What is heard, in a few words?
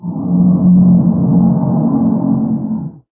water growl, ichthyosaur; hl2